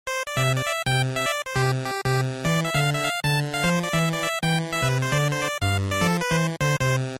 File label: 8bit loop; Fix-It Felix